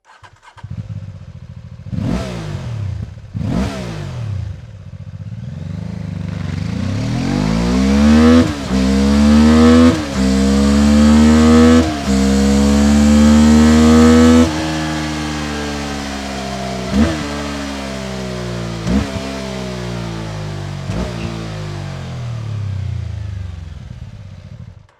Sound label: Slip-On BMW F 450 GS; Akrapovic